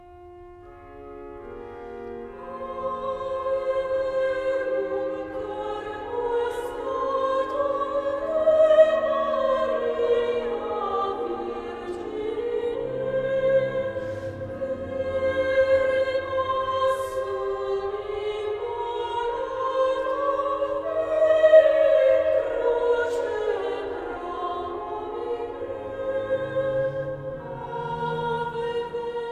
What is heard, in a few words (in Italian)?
voci